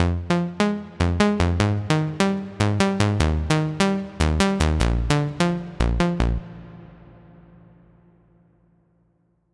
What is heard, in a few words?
Future Bass; midi wizard 2; Hybrid Standard